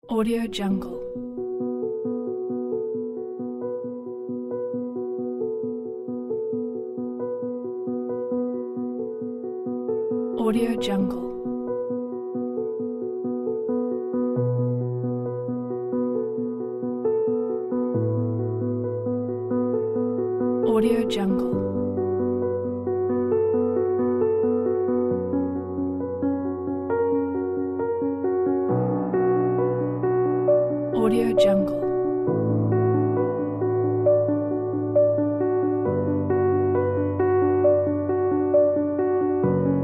tragic piano theme

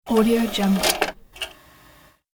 dvd player closing

